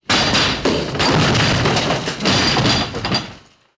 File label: Debris Crash Wood, ransack room